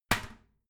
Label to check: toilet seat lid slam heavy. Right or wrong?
Right